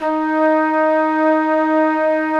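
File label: WND, Hybrid Winds, Flute & Flugel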